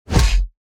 mines fail

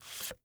card slide; blackjack

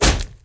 gun impact

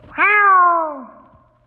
meows

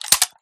switch; scar; resurviv

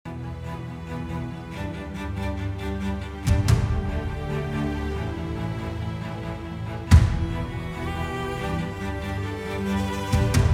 music

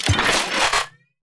Double Barrel Pickup

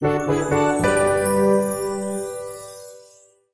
success Screen Enter

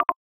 notification error

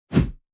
turn right

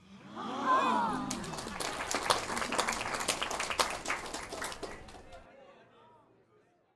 crowd Reaction guild small Reveal; spellbound

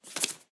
wood tile drop

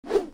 throw

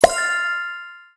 unlock Hook

